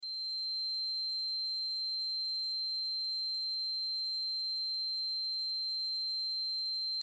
flashback

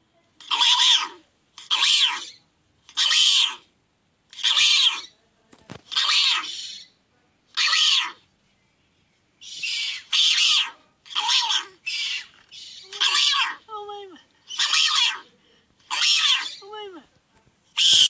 awawa full version

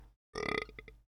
burp

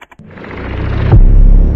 appear

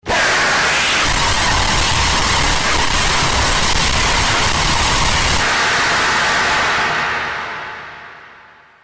storm

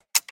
button sound

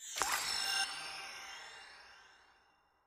droplet catch

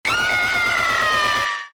baby scream; nullscape

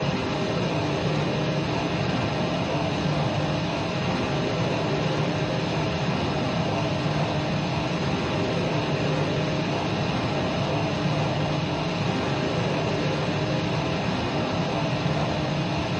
ambient, cave

